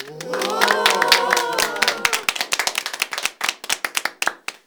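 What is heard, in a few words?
HAND CLAP + OHH, SMALL CHEER; 1000fx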